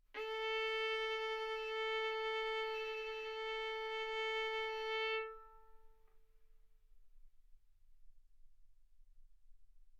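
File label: Violin arco mf A string A4 B4 stereo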